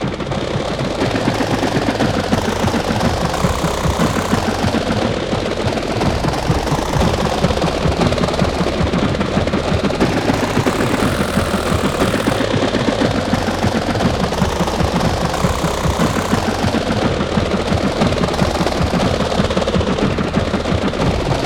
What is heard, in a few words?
helicopter